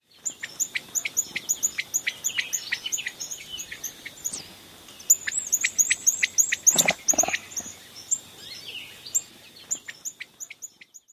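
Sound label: encounter calls, northern cardinal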